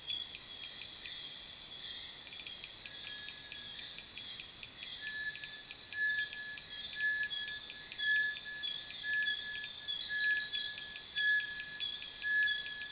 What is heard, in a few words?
Tropical dawn chorus Peru